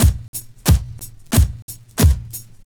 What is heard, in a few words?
90 VNYL, DRUMS